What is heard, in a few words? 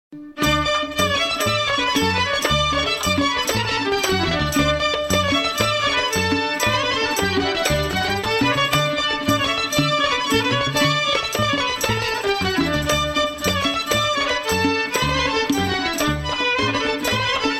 Instrumental Dance